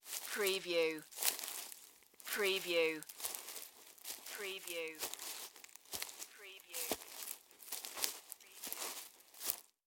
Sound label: Footstep Grass Walk